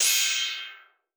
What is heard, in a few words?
Cymbal, Drum One Shots; DNA; Hip Hop